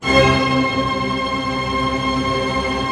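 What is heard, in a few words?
Strings Tremolo C; Orchestra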